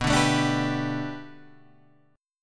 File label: B Minor 7th Sharp 5th B Guitar Open G, chord